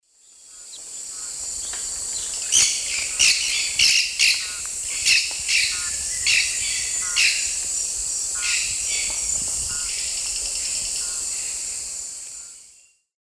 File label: Pyrrhura melanura, group flight calls; S. America; Eastern Lowlands